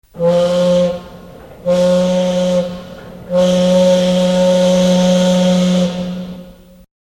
警笛; 機械

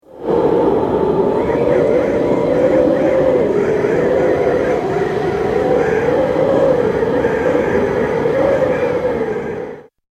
ジャングル

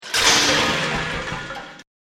ガシャン

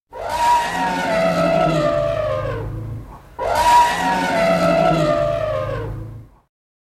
狼

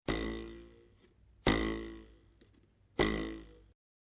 ボワボワボワーン

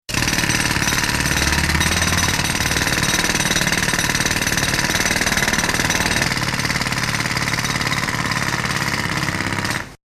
削岩機

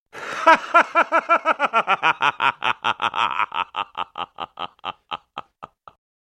笑う男

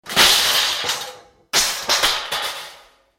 ギャシャーン

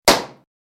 パン

